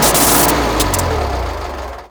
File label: heavy death; Dystopia